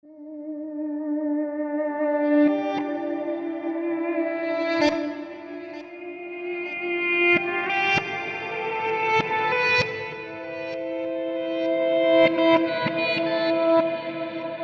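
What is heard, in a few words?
Guitars Melodic Pulses and Phrases reverse; Scoring Guitars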